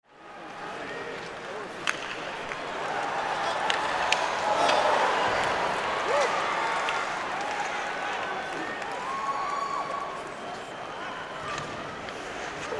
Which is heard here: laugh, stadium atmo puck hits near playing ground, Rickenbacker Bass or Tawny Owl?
stadium atmo puck hits near playing ground